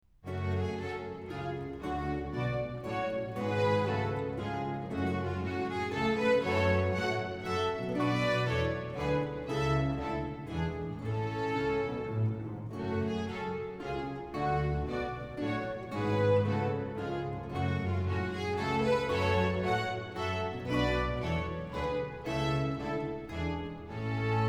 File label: Menuet, Water Music; HANDEL; Pinnock; Suite 03 in G (HWV350)